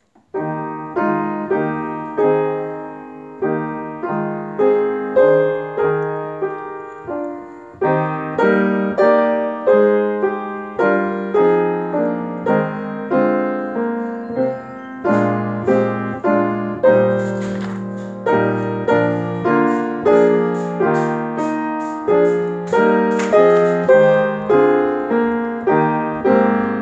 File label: tutti